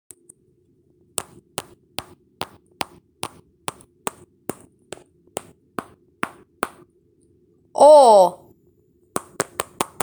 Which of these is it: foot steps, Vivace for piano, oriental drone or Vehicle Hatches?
foot steps